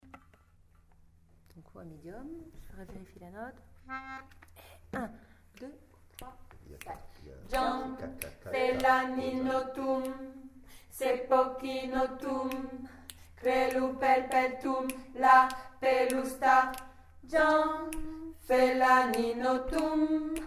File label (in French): voix medium